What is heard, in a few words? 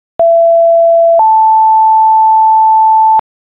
amb, tones